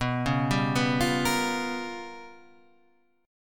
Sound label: G 7th Sharp 9th Flat 5th